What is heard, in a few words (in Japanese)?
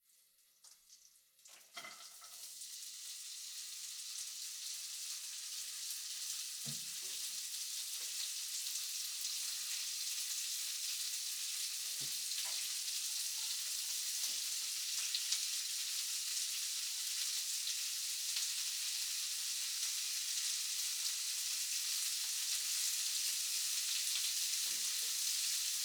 炒める 弱, 食事に関する音; 3DIO